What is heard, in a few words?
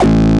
dance, bass; Techno Trance Essentials